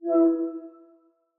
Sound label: invitation accepted; iOS 27